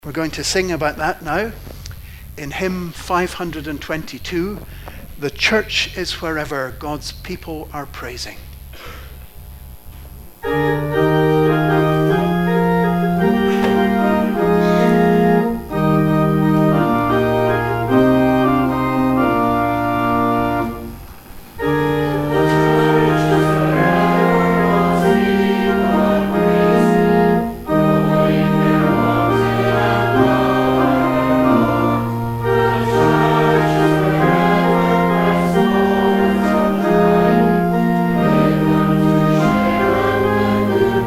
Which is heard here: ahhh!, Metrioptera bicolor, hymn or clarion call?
hymn